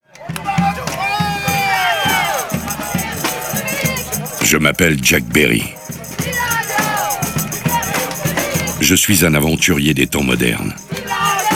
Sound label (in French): Ambiances BINAURALES; HARRIS MEDIA; son binaural